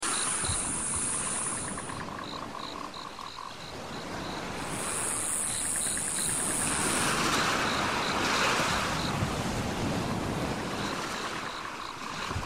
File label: TROPICAL WAVES